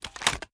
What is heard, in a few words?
reload empty start; reloads; gmod